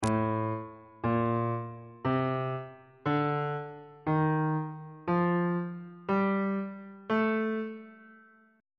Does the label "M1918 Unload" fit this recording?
No